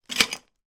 foley broken dish pieces in bag down on table; zapsplat

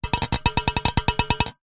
foley glass pane small vibrate taps on metal; zapsplat

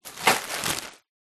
food frozen peas bag place in fridge; zapsplat

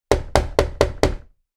door knocks small glass window on wooden door; household

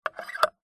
household lock metal turn click contact mic

